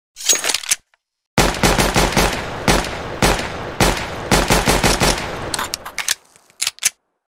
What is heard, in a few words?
p2000; csgo